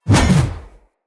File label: Spear Spin Whoosh; Weapons; Enemy; Nimrod Spear